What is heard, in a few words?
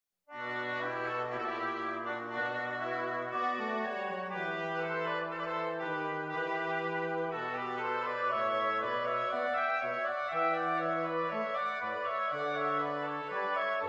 tenor solo